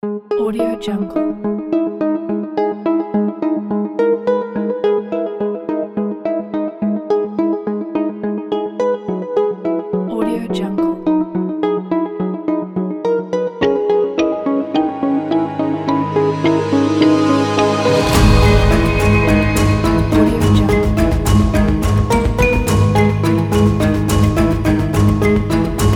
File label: suspense dark cinematic; cinemati-x